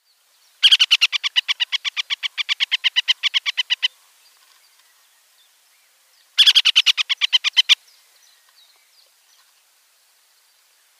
Hen Harrier male

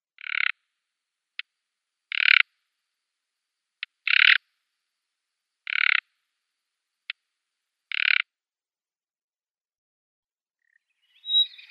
Common Nightingale calls

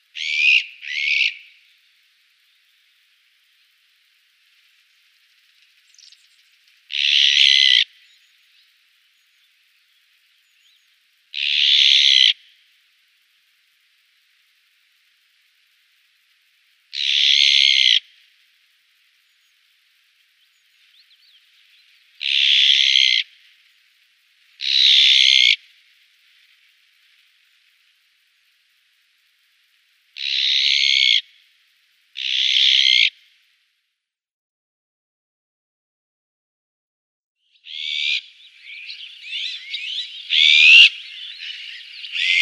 Azure-winged Magpie calls

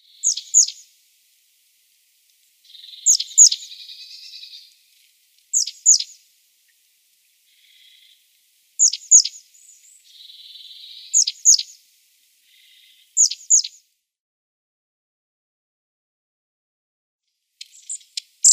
Long-tailed Tit calls

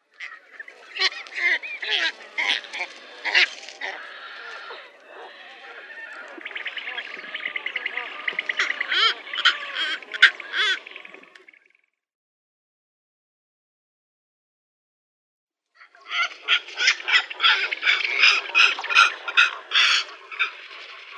Long-tailed Cormorant

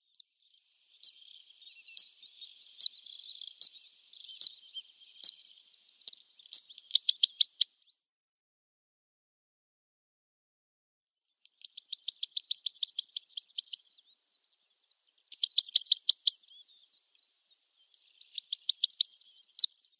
Zitting Cisticola calls

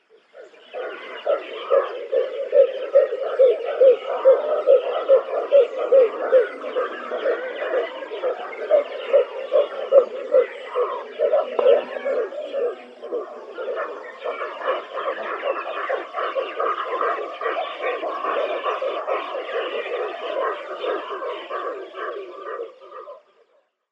Pink-backed Pelican

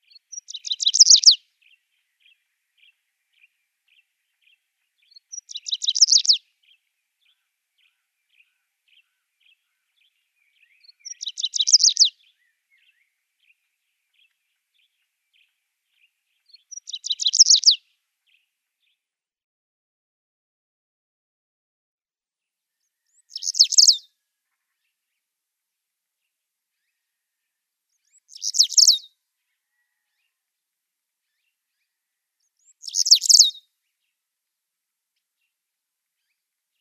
Cinnamon-breasted Bunting calls

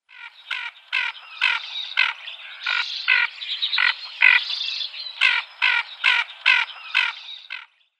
Ferruginous Duck female flightcall

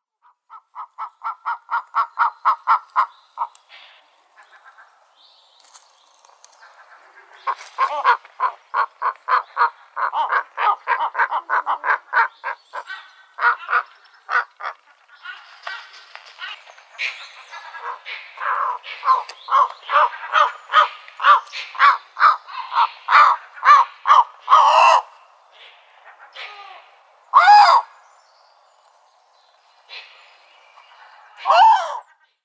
Bateleur

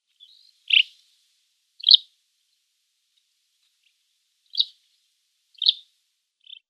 Razo Lark calls

